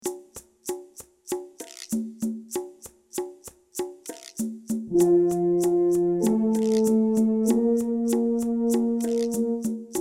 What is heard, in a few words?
Find Eb; brass; backing tracks